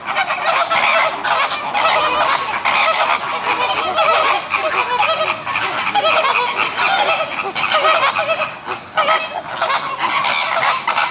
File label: flamingos at zoo; 動物園フラミンゴ群３